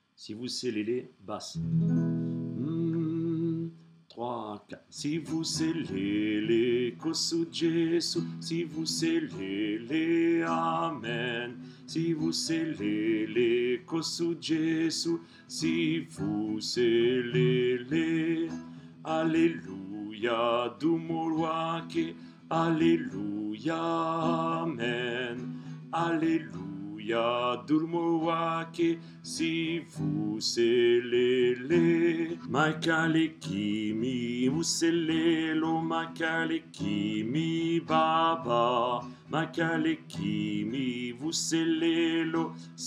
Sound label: sivuselele basse